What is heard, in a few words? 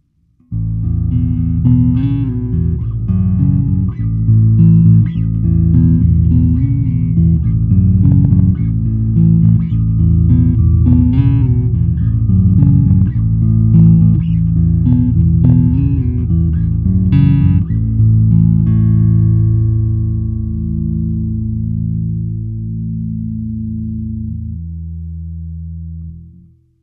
Bass Line